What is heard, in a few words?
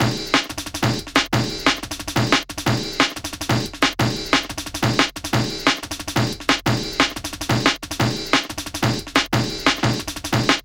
Killer Beat, Drum & Bass, Beats & Breaks; Fluid Dynamics